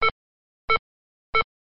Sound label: Heart Monitor Beep